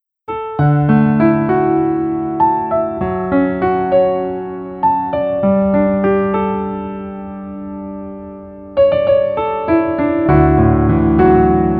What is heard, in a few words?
Piano; 슬기로운 의사생활